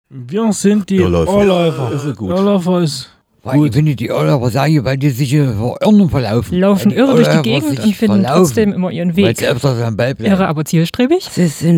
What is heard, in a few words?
Jingle; Irrlaeufer; inklusion; feder·leicht